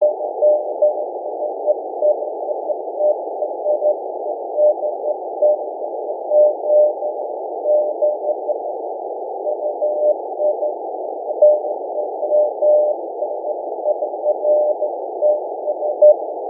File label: fast fading cw 0db bw500